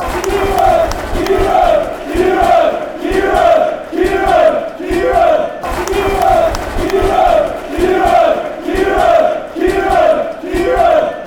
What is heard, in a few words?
fanchants; kieron kieron